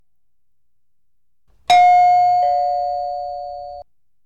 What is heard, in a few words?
doorbell